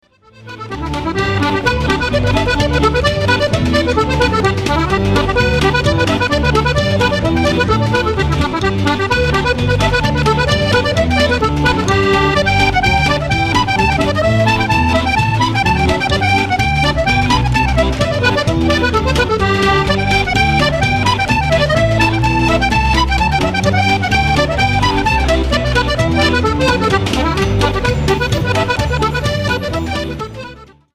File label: Instrumental Irish Reel Sally Gardens